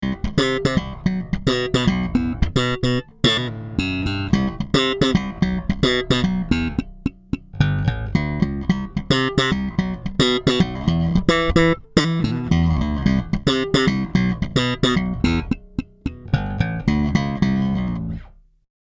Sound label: Slap Passif Tone, basses sadowsky NYC 5